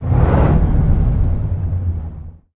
t90a engine int load, engines tanks; War Thunder

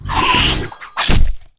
terracotta throw spear

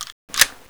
Inf Weapons Foley 3rd; Red Orchestra 41-45

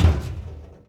Gear Lock Up, Aircrafts; DCS

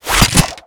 kiting lift arm

